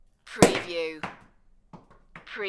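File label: Champagne Cork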